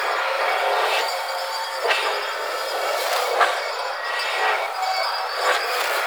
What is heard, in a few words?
Dagger Proj Loop; Warframe; Weapons; Duviri; Incarnon Adapters; Incarnon Okina Prime